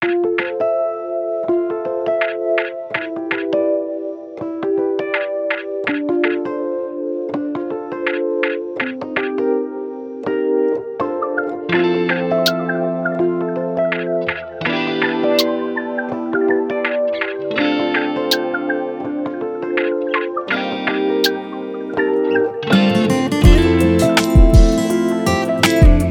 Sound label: BGM Chill BPM82